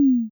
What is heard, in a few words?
player wrong